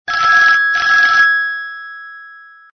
Old Phone

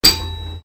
Bonus, pinball ringtones

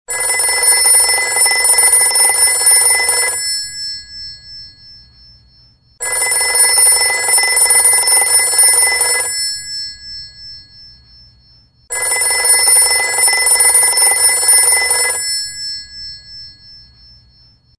Old Telephone, ringtones

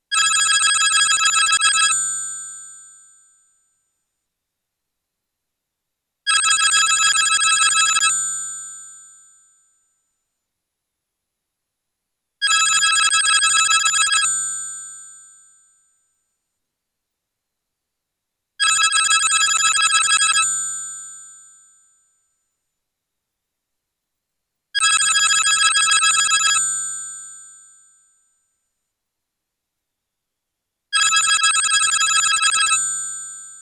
Old Italian Phone, ringtones; old phones

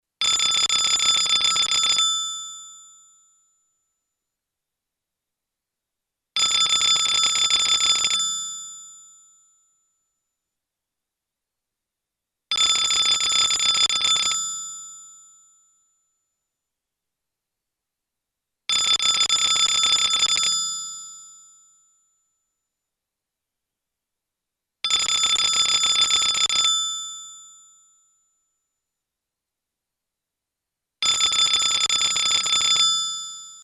Old Icelandic Phone, ringtones; old phones

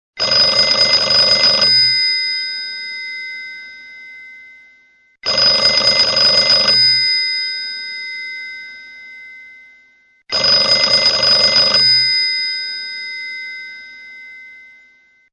antique phone, old phones

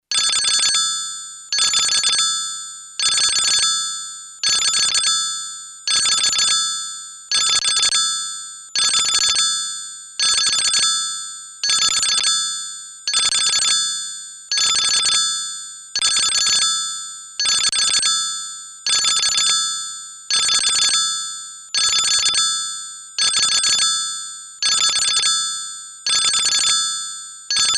Old Belarussian Phone; ringtones; old phones

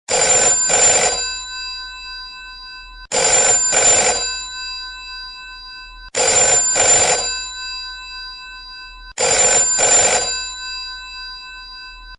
High British, old phones

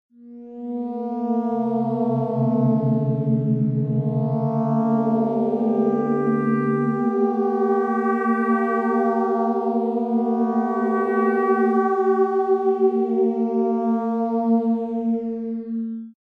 Sci-Fi ringtones; clunky, analog sounds of vintage sci-fi films; iPhone-Compatible Sci-Fi Ringtones

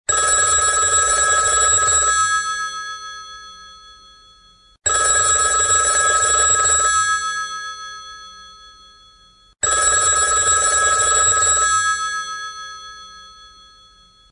Rotary Phone

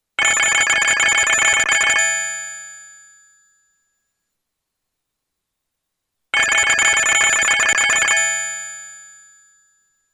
Old French Phone; old phones

